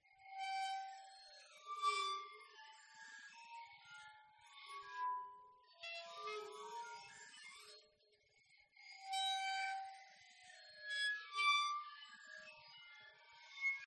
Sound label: waterphone long bows dynamic; aston stealth